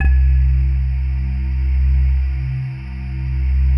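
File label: PAD, atmospheric pads; Best Service; XX Large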